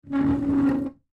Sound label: Chair Bar Stool Slide Fast Ceramic Surface; Chairs; Foley